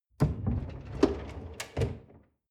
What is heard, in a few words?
folding door open